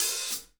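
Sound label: hat open close, drum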